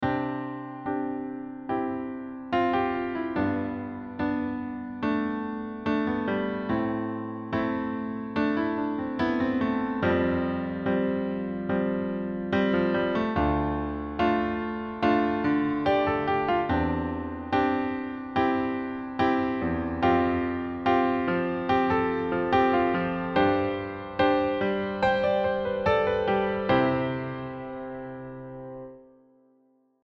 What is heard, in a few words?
sus2 sus4